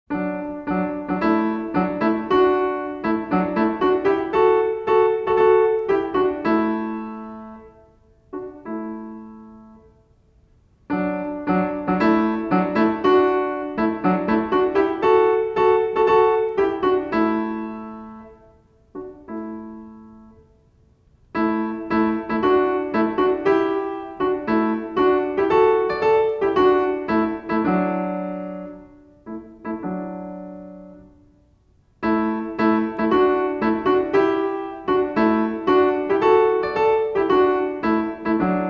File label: The Hunting Horns And Echo; Magrath Book 03